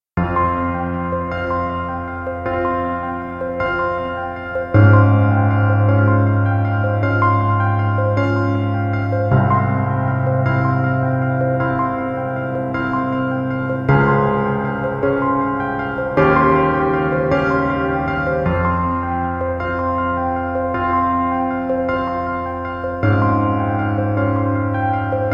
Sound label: piano